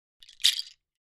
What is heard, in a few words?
Shaker Bracelet Natu, percussion shaker